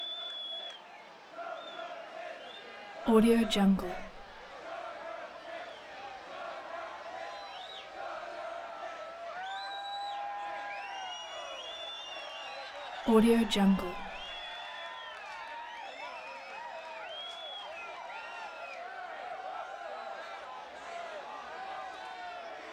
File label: mardi gras constant bourbon street; prosoundfx